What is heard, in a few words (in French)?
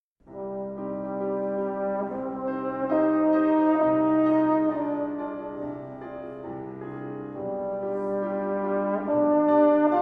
Trombone